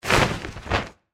Monster Wings Flap, Horror, Monster, Wings, Flap, Cape, Movement; Monsters